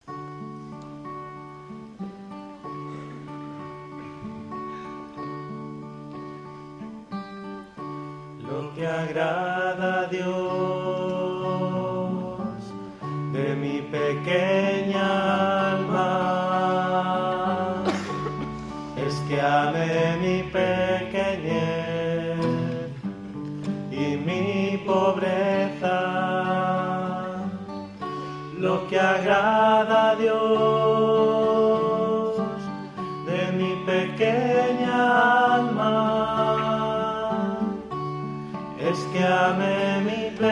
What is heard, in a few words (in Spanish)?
tenores